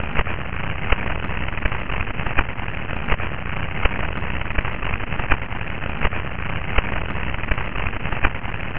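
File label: pulsar detection 4 pulses spaced of 714 ms pulsar period; psr b0329 54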